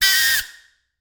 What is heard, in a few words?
Industry Tool Air Escaping From Air Compressor Squeaky